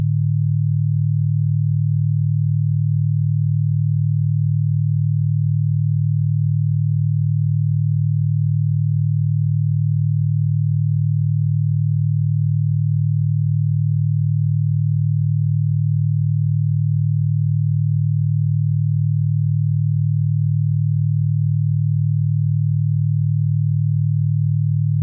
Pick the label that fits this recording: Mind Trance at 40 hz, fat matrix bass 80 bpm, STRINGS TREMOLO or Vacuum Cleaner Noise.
Mind Trance at 40 hz